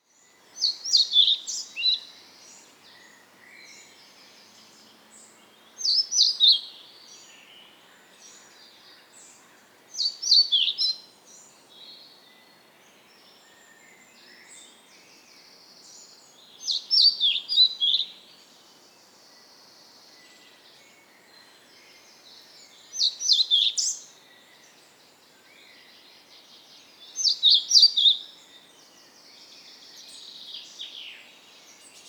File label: Pied Flycatcher